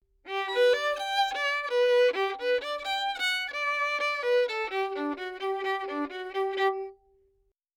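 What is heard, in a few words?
Dry, 3-4, G major, 128 bpm, melody, violin; Sample Banks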